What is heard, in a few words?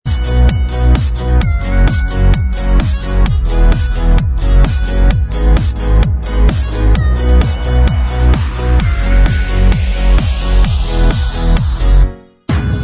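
instrumental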